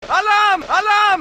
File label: alarm